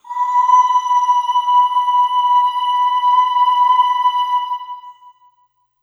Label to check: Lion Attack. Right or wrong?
Wrong